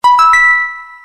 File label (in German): nachrichten alarm